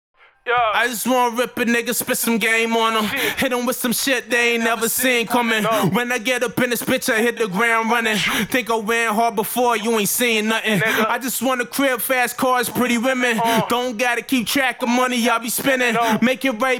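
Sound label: hip hop 185 B minor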